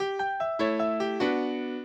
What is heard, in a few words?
minuet, mozart